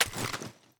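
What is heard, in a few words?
raise first movement; gmod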